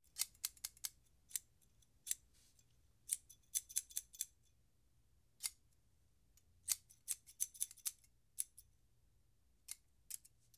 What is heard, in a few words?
Barber Scissors Cutting Hair Snipping; Foley - Props; Foley Essentials